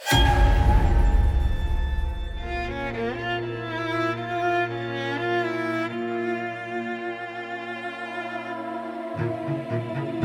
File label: Battle Theme; House of Healing